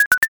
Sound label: chip